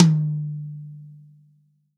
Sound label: REAL DRUMS POP KIT